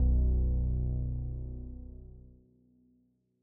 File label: 90 Bass Tail, New Age Ambient; Spring; Tail Samples